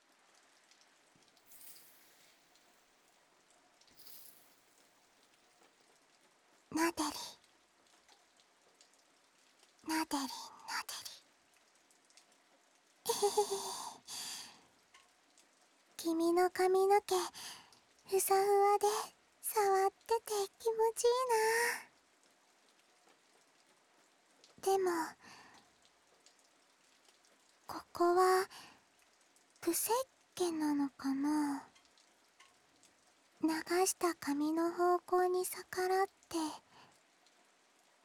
耳かき（左から右）

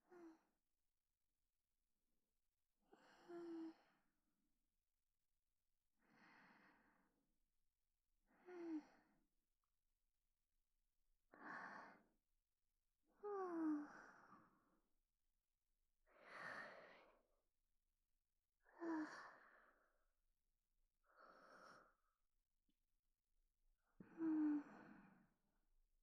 バイク走行音・風音ASMR; 抱きしめツーリング♪

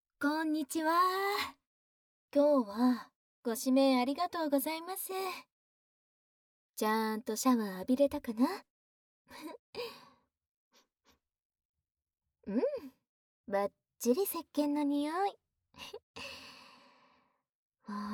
ぺろぺろ, ASMR; 人妻デリヘル; あまあまでぺろぺろ

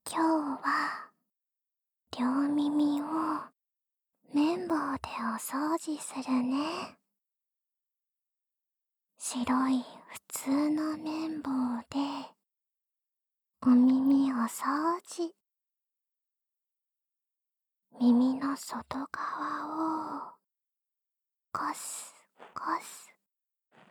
音フェチ安眠 耳かき; ふたりがけ催眠ドライイキサポート編; 性感トレーニング

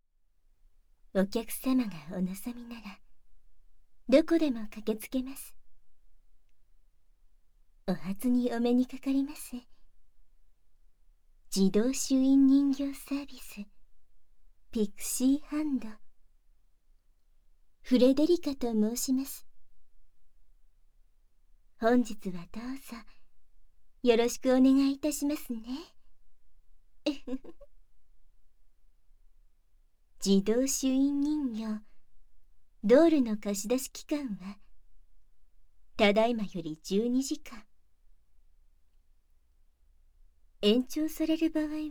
乳首責め・耳舐め, ASMR; 挨拶代わりに乳首責め・耳舐め

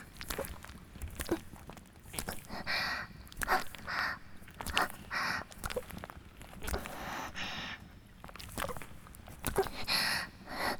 雨降りバージョン ギシアンループ; 田舎の別の無感情少女と自己犠牲愛2; おまけ (第3、4話のギシアンループバージョン)

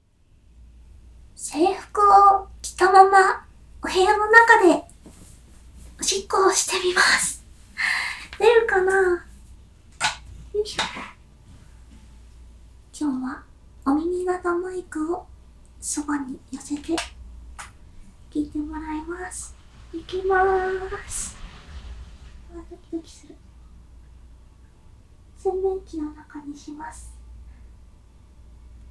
衣装を着ながらの着衣おしっこ！いつもと音の感じが違うかもッ！

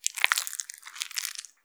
挿入or引き抜かれ終わる直前 膣内射精後or興奮時; 挿入or引き抜く

